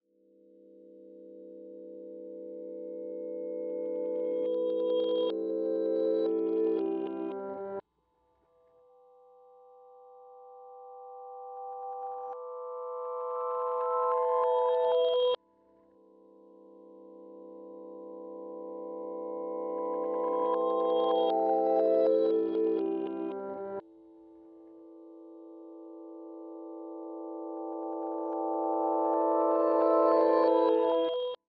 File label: Rhodes glitch